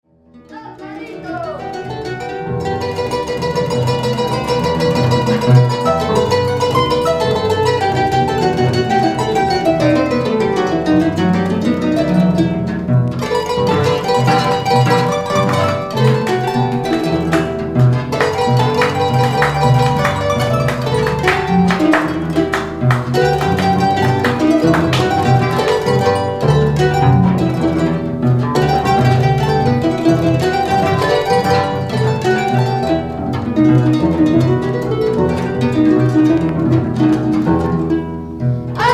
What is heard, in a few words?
inca harp